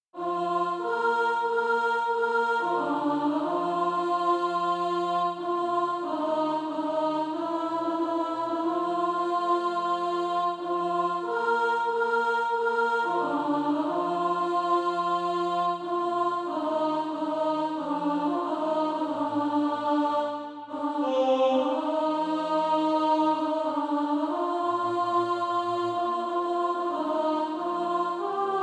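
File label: alto, Practise Music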